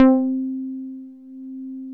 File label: MOOG1 BASS C4